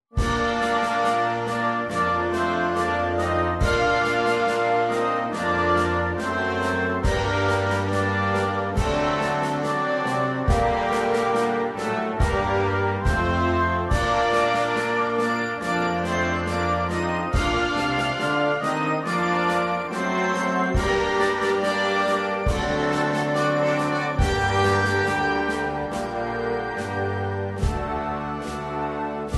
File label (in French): Hymne Ukrainien Harmonie